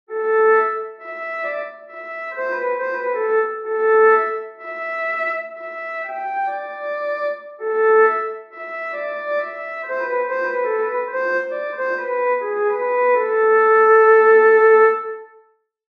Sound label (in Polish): Altowka